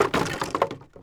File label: wood debris crash